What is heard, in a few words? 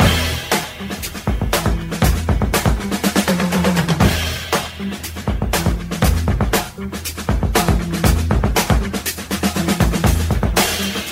light guitar, funky drum loops